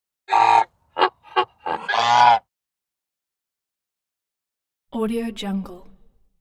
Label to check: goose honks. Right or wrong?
Right